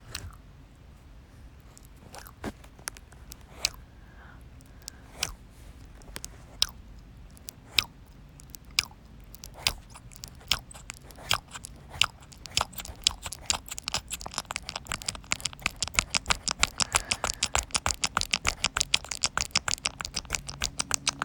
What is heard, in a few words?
wet sounds